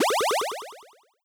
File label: power up item fast